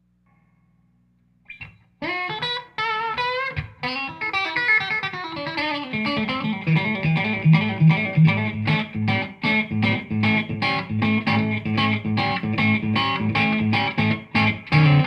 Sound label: Stratocaster Shuffle Pos 4 PU Clean